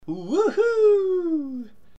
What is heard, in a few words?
woohoo